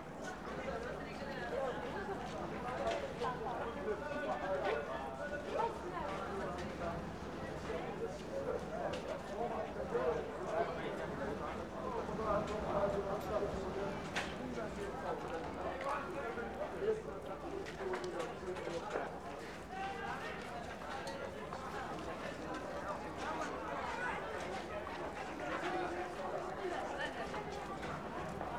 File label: ambience General; netent